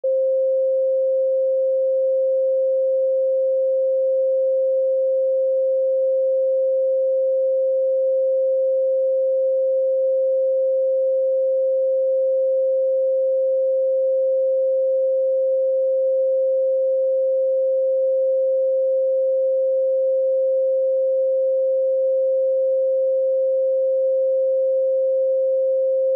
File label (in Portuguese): regeneracao ambiente; frequencias